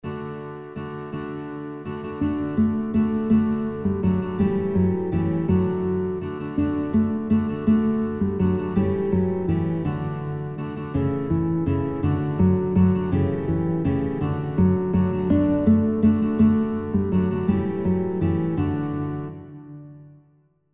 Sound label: Guitar, kinderlieder gitarre playalongs; Guitar Songbook; Heissa Kathreinerle